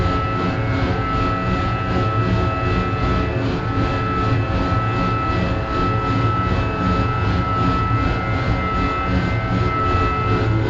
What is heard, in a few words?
Robot Arm Track Slow Close; Boomtown; Titanfall 2